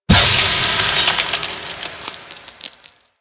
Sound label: Ice Special; Angry Birds Space